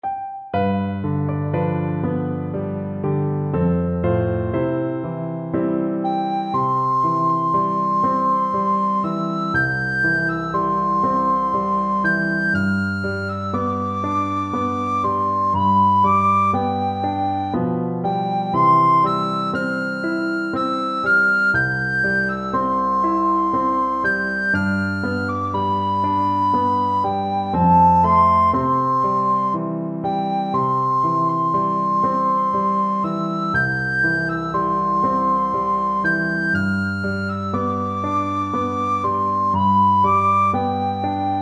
avondale recorder

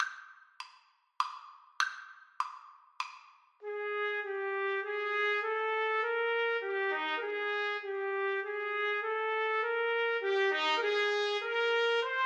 Menuett trumpet duet

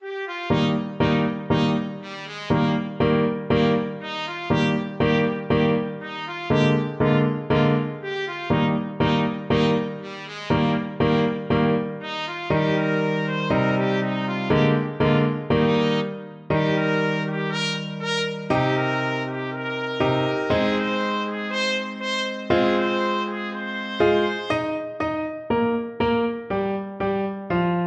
trumpet

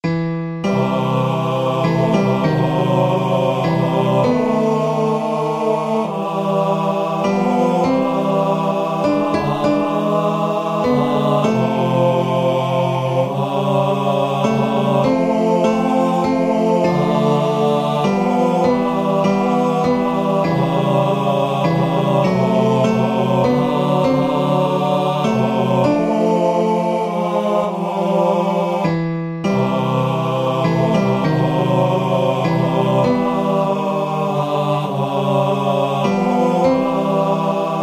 dont cry, barbershop quartet

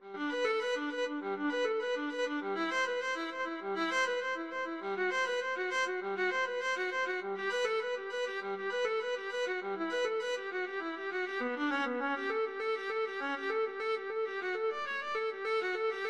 cello suite, viola

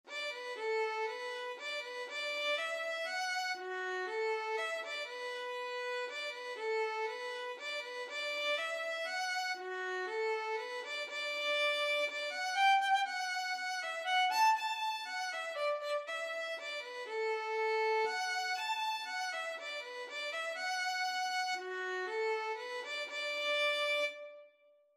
violin

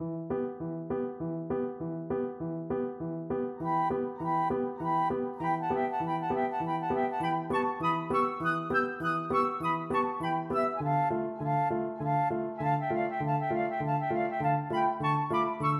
chanson boheme flute duet

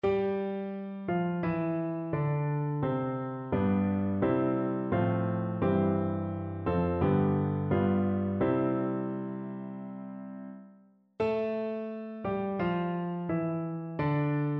norway national, piano

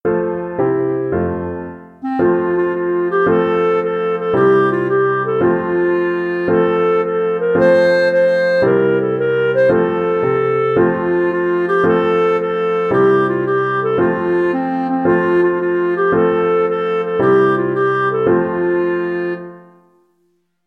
itsy bitsy, clarinet

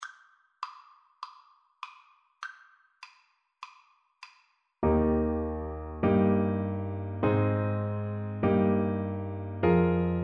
twinkle twinkle, Tuba

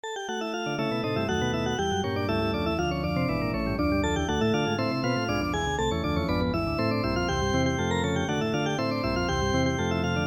recorder quartet

